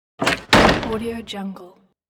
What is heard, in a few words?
door closed